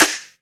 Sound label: Claps & Snares